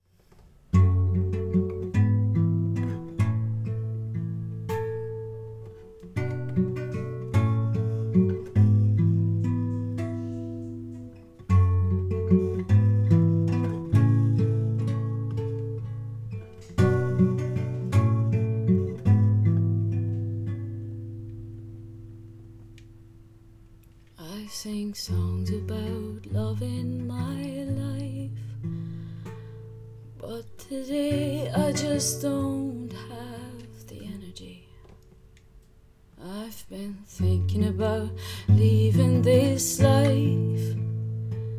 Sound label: Acoustic Version